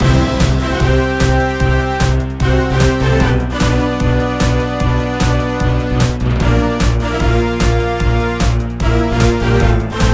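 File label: background music map; ovenbreak 2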